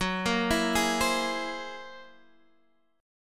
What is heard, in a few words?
Gb 9th Flat 5th Gb down, chord; Gb9b5; arpeggiated; JGuitar